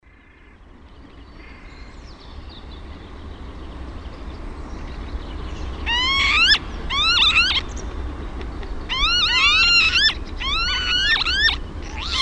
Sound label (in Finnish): TOYHTOHYYPPA; LINTUAANITYKSET